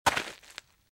Footsteps - Gravel - Walk - Left; RadwinIsland

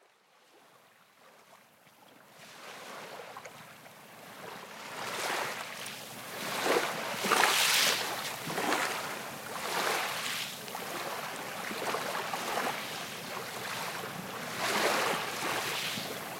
ocean; firefight

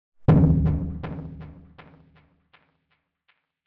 Impact Abstract Timbale Drum Hit - Double, Echo; Hollywood Edge; sound designer's toolkit v2

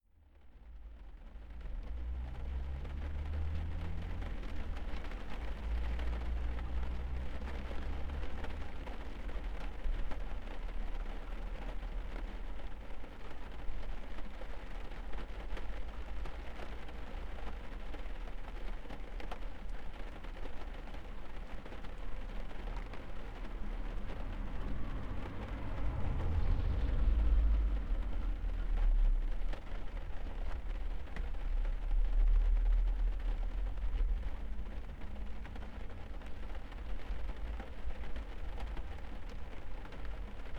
rain crash; Apotheorasis

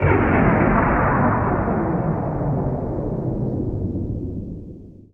POW; XXL 800 Production Effects

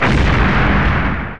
Explo Medium; SFX Kit; Destruction

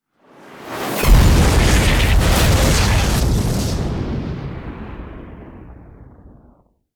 Pipe Explosion - Some Glasses; Hollywood Edge; sound designer's toolkit v2

